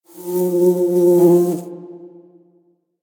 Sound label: Exterior Foreground Bug Flyby, Ambience; Warframe; Duviri